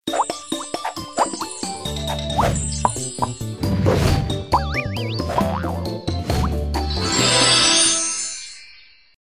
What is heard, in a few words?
draw card ten